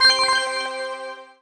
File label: COLLECT ULTRA; impact